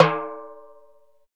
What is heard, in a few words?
timbales, Latin; Roland L-CD701; PRC Latin 3